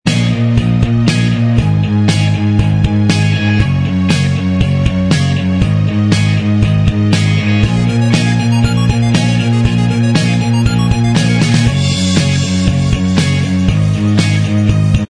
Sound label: Inst Track